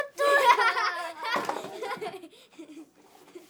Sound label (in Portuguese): Risada Zoeira Kids